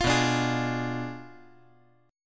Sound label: B Diminished 7th B down